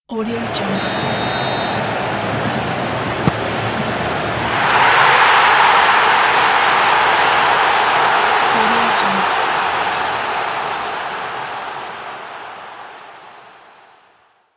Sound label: soccer kick and goal celebration